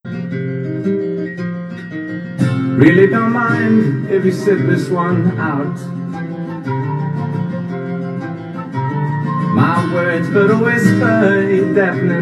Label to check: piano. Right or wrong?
Right